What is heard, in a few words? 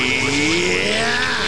fall; vegeta